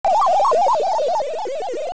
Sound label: Die Tune; Link dying (tune, edited to remove clicks)